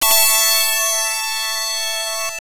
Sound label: Unknown Jingle